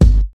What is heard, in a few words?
old school clear rap steel kick drum sample g key